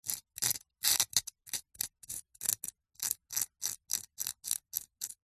foley stone rock carve engrave scratch with small sharp metal spike